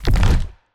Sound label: Bone Golem Attack; TANK; 3Souls